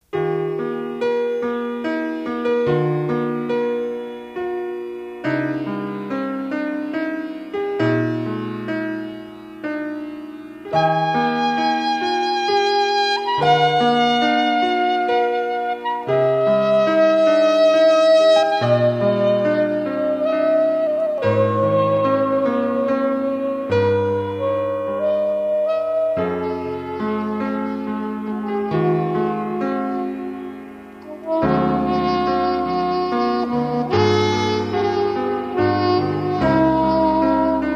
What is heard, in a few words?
Wedding Song